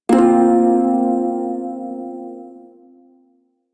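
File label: Prime Shawzin Pentatonic Minor Chord K; Music; Warframe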